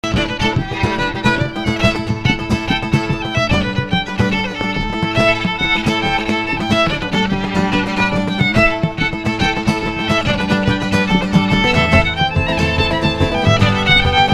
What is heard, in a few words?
Three Jigs